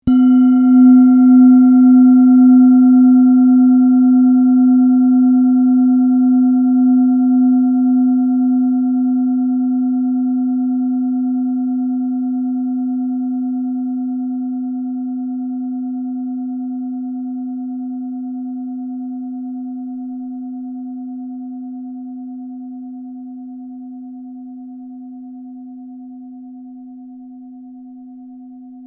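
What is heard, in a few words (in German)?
klangschale sonne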